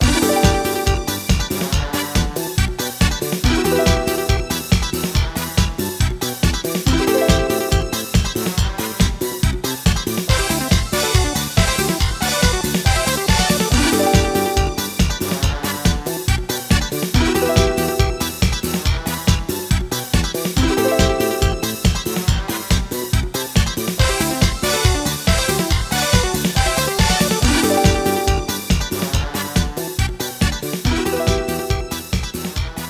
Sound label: Disco